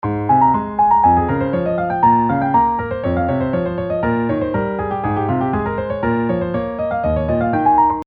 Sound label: Ab Major Canon; Logic